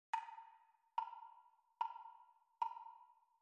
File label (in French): Rythme Piano Seul